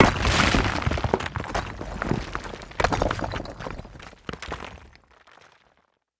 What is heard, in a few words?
stone gib; Rust